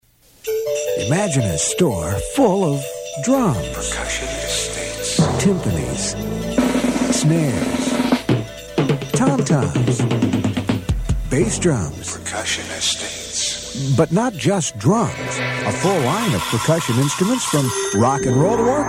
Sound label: Percussion; Estates